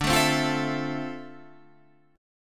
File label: F Major 7th D, Fmaj7/D chord; strummed; JGuitar